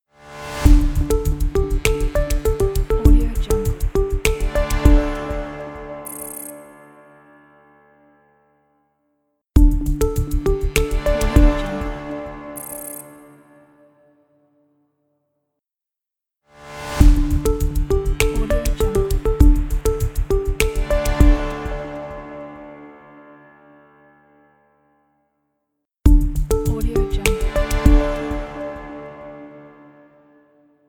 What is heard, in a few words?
minimal corporate logo